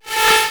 Synths; Vengeance Samples

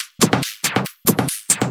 Loops Fills; Vengeance Samples

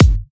Bassdrums; Vengeance Samples

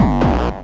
BD Distortion, Bassdrums; Vengeance Samples

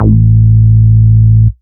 Bass Root A

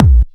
Bassdrums Tekhouse; Kicks; Vengeance Samples

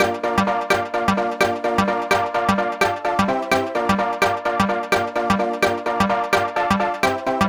128BPM Melody; Vengeance Samples; VFH1 128BPM Moonpatrol Kit

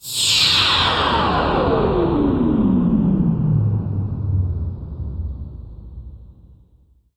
Downlifter

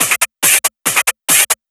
Breakbeat; Vengeance Samples; VEC2 Loops

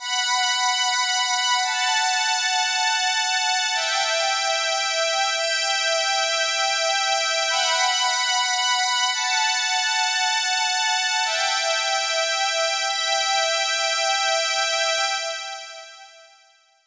Female Pad, 128 BPM; Vengeance Samples; VTDS2 Song Kits

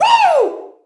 Vocal Oneshots; Vengeance Samples; Vocals

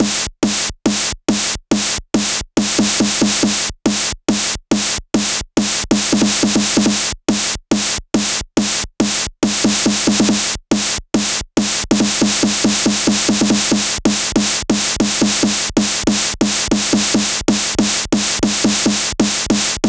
140BPM SNARE 16 Bars; JollyJumper; Vengeance Samples; Snares&FX Pairs

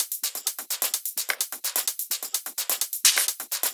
Drumloops 128BPM

